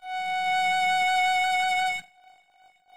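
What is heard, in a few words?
harmonic test signal separated; Separation of Sound Sources by Convolutive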